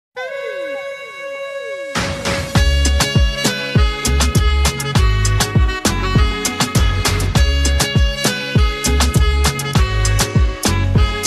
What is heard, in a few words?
Instrumental Ringtone